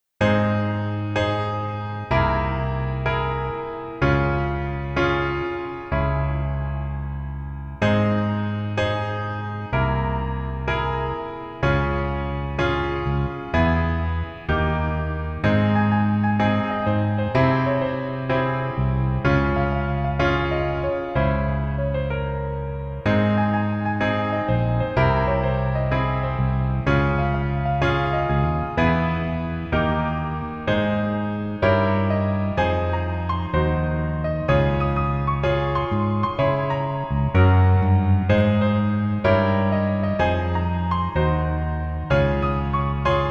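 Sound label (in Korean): Piano version 멜로디 MR 여자키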